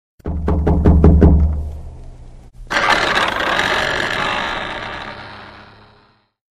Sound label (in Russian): stuk i dver